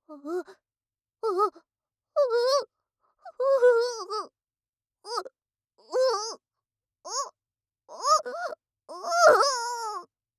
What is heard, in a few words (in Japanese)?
お? おっ おおっ おほぉ…